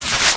scrunchy

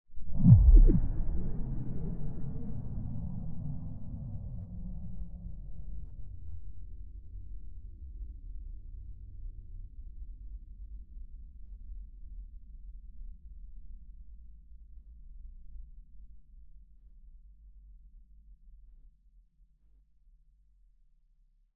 crunch woomph

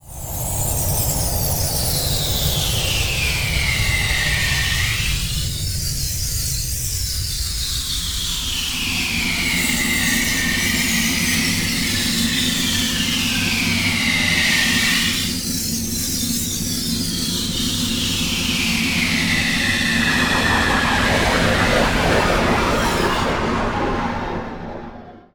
In Flight Spectrogram detail

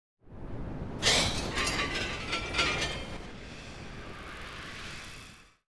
crunch zones 3D

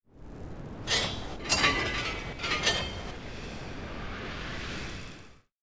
crunch zones 3D

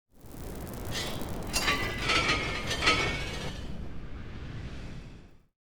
crunch zones 3D

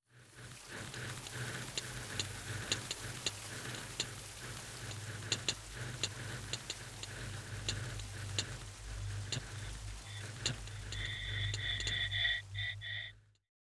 time clicks fire

